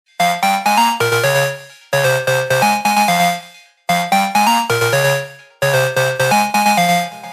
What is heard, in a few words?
electro mix